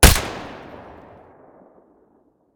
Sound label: ak105 silencer mono, weapons; STALKER; IWP